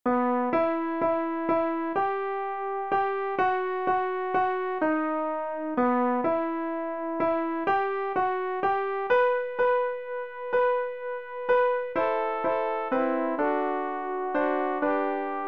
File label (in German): alt, Übehilfen für das Erlernen von Liedern; bewahre uns gott behuete uns gott